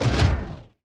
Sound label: turret inventory move; factorio 2.0.55